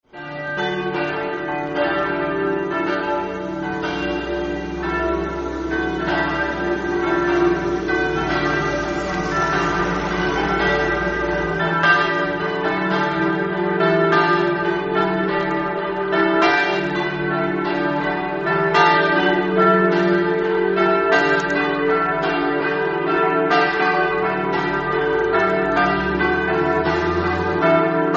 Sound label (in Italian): campane; nogaredo